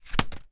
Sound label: foley paper scrunched up thown against wall or surface bounce